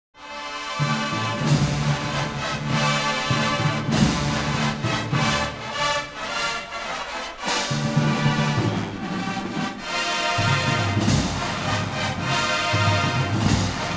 Fanfare

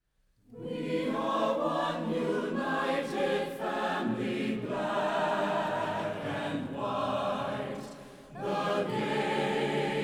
Hymn; Chess

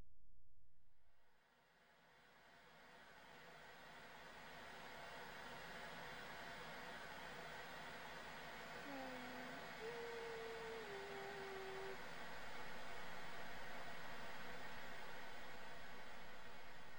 Sound Effects - Television Noise; Kunzel

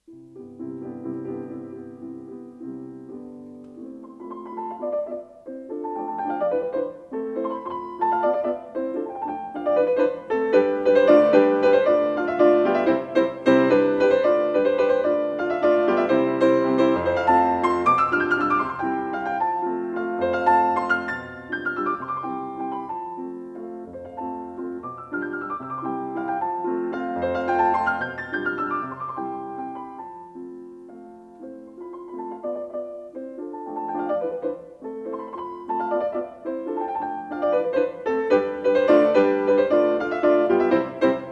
Mazurka in C major - Allegro non troppo; Chopin; DG

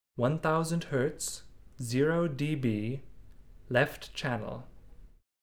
Test Signal

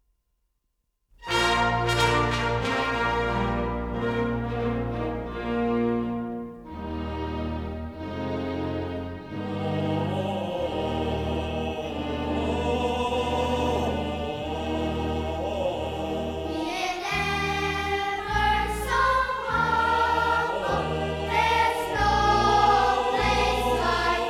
Children Sing, Priests Chant; Rodgers & Hammerstein - The King and I (1977 Broadway revival cast)

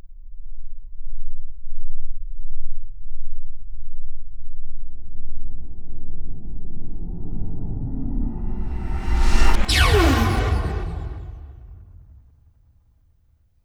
Starship Flyby

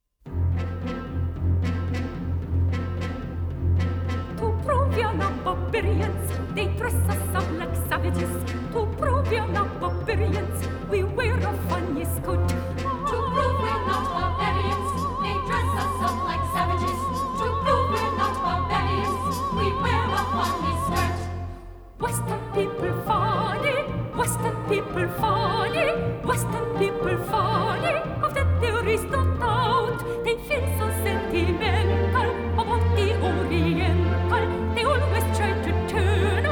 Womens Chorus; 1977 Broadway Revival Cast - The King and I

